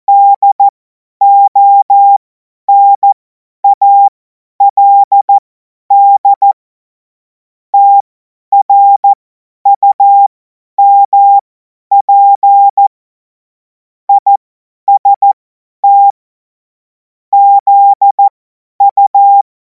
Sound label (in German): Witz, Morsekurs, cw morsen; hamradio; cw witze